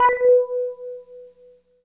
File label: PPG pop, PPG Wave 2; Greytsounds VOL-1